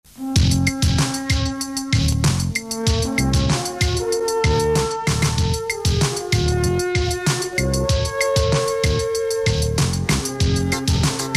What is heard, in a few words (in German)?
jingle rap mit alphorn; regiofm